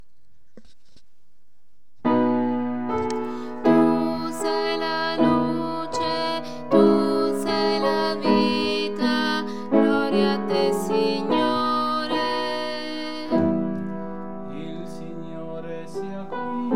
contralti; preconio

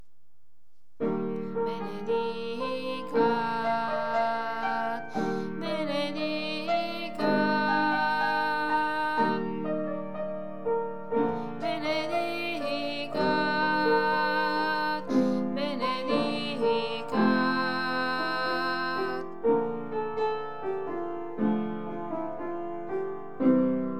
Contralti; benedicat

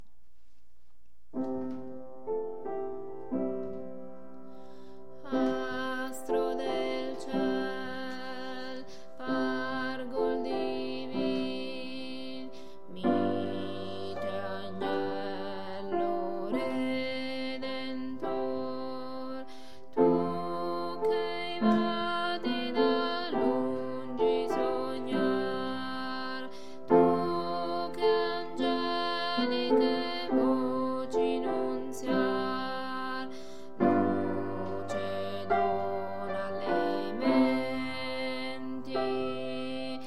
astro tradizionale bassi

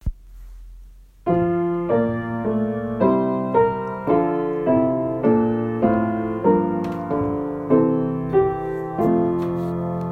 notte di luce contralti

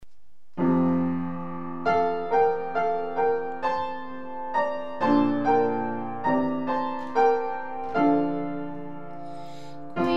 regina coeli soprani

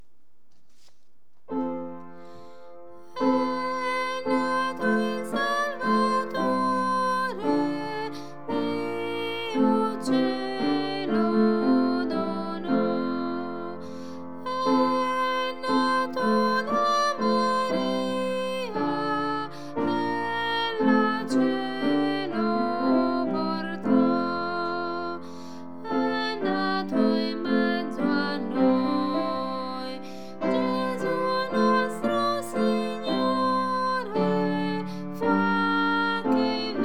corale praetorius soprani

